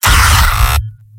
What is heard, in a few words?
m pain sharp; demoman; tf2; garrysmod; mvm